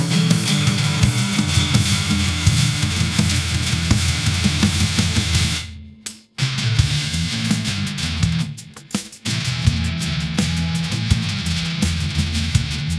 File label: spanish nylon riff ringtone; ai-generated